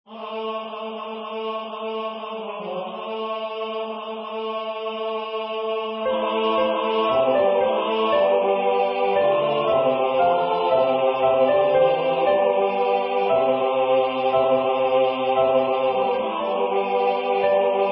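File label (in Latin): Missa Gloria Soprano; Unitate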